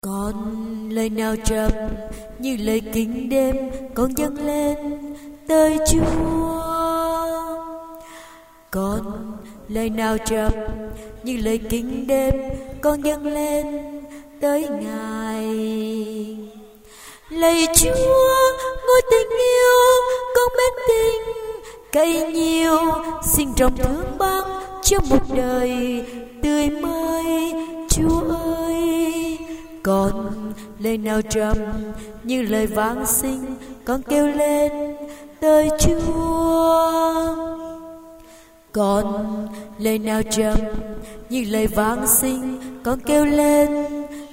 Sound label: sop alto